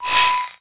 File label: obj new; War Thunder